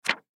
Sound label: Flash Card Hit; Paper; Materials; foley; Blastwave